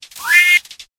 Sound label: animation, sound-effects